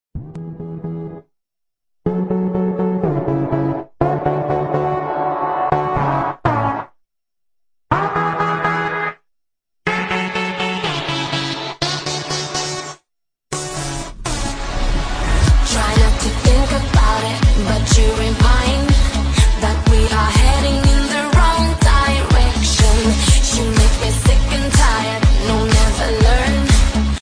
iphone 6s remix ringtone

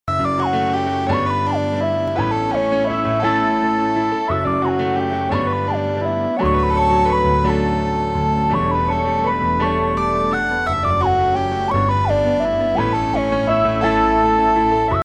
ringtone; nokia

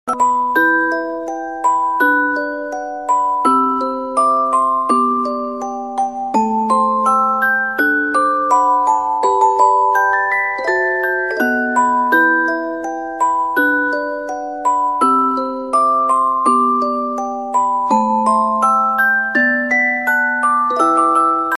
sound effects sweetest iphone 4s remixs ringtone

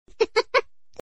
hehe lol ringtone

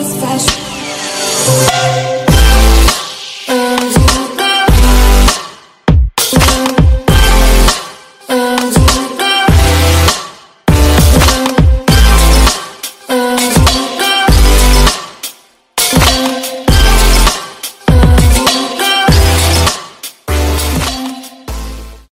ipnone 8 plus vocal ringtone

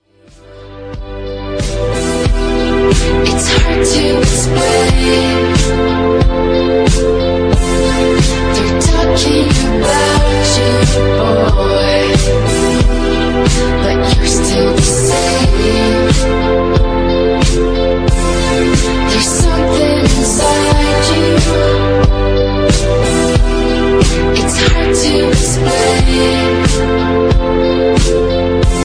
iphone 3g s ringtone

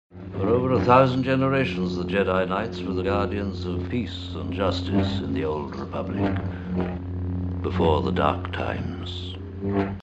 oppo smart phone ringtone

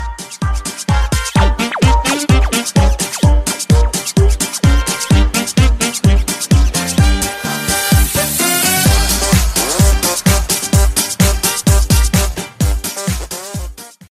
funky ringtone; ipnone 4s new

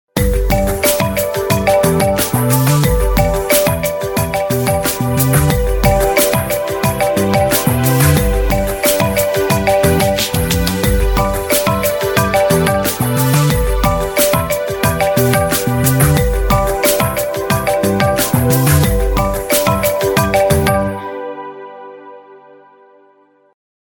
apple iphone dingdong i phone x ringtone

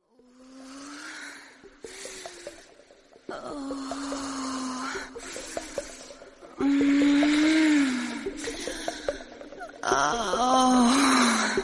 ringtone; iphone 8 plus french

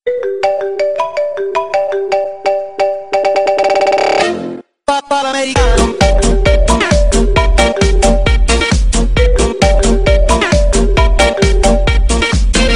marimba remix of iphone ringtone

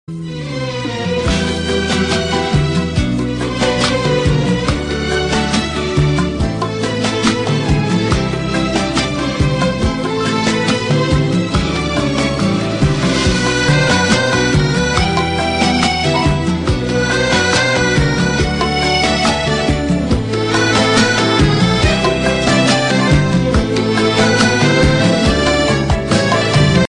i phone 8 plus arabic ringtone

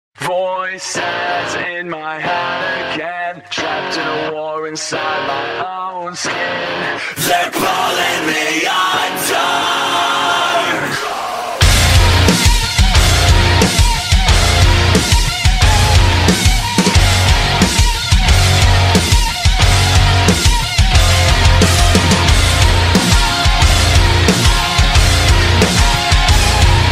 iphone x remix voices ringtone

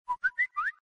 whistle

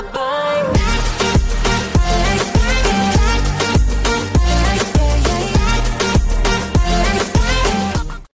spaceship iphone 7 plus remix ringtone

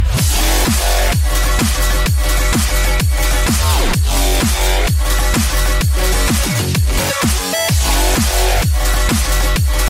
iphone x remix ringtone

